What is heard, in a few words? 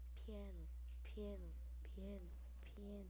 piano